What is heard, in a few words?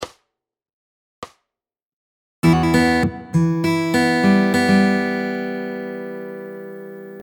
Fingerpicking Lick Variation; Fingerpicking Fun